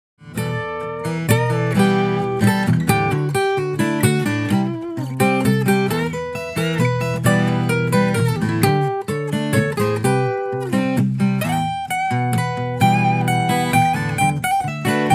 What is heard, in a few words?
Solo Acoustic Guitar Cover